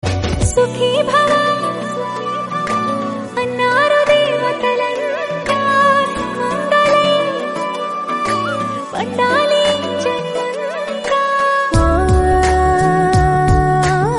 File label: telugu song; rana daggubatti; kajal